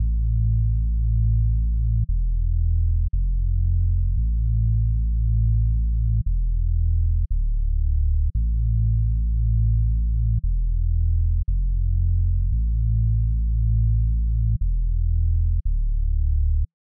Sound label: 115 BPM A Min Bass; Loop Stems; Cymatics; Infinity Melody Collection; Palm Trees